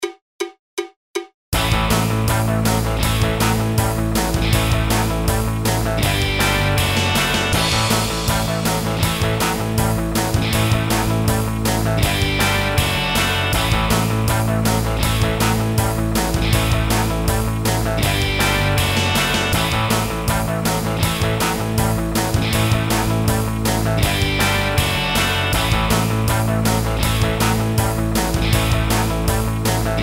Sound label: rock; 50 licks